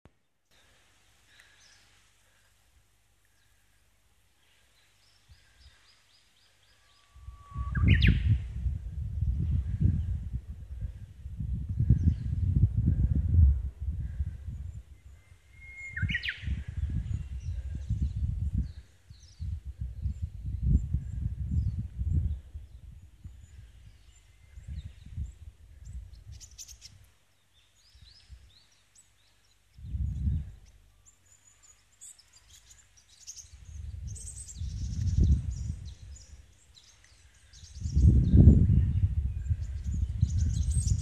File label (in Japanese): ウグイス, 前山寺